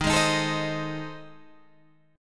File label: A Minor Major 7th Flat 5th